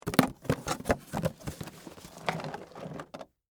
Cardboard Box Close, Foley, Cardboard, Box, Close, Full; Materials; Blast Wave